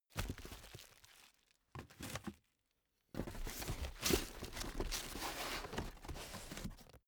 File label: cardboard box big unpack handle